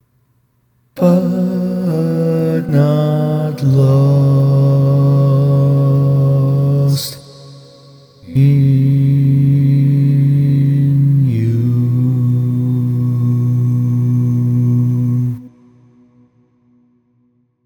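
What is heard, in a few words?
baritone, barbershop tags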